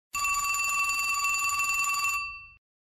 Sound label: Vintage Telephone; LG; P520 Terry